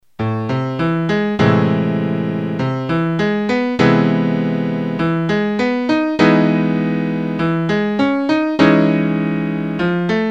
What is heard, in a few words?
hungarian scale four note chords